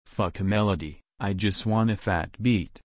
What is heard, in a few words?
Phat Beat